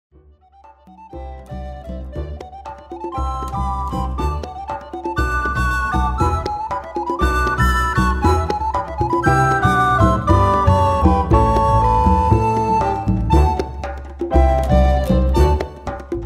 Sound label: presto flaut